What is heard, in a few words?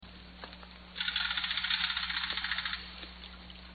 tonal squeak